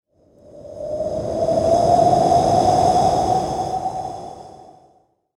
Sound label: airy smooth transition